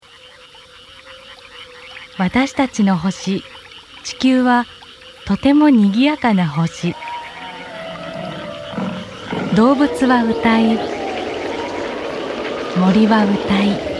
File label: Technics Speaker